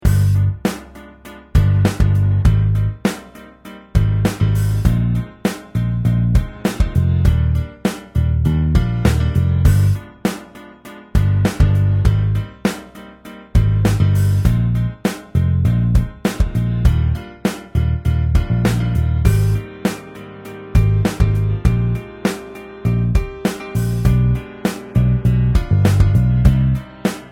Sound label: 200 Rock Groove Rhythm